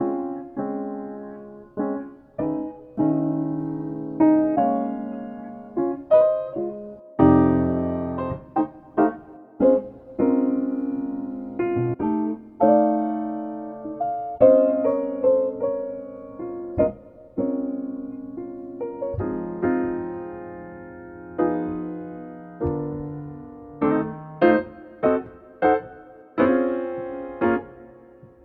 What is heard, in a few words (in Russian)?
Fortepiannaya Muzyka Retro